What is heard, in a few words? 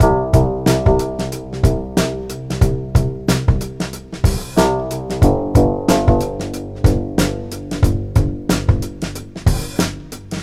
Fender Rhodes Bass; Keenan